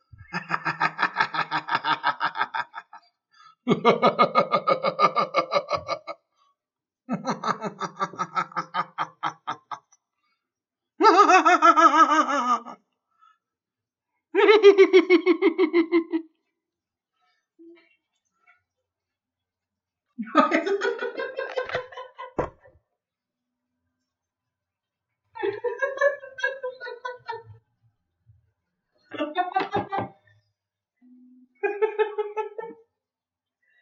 Risas De Villano

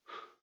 Soplar

